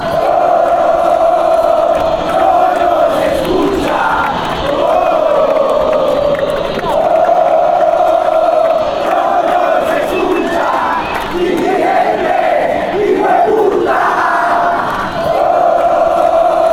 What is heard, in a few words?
atletico nacional chant